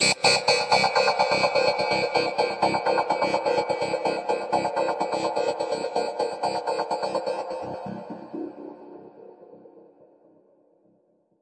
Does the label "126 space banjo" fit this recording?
Yes